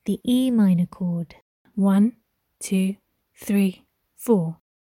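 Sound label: organ, E minor chord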